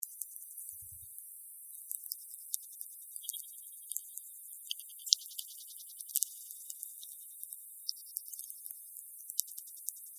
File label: ice bubbles, ice core; normalised levels + pbu noise reduction; ice core reseach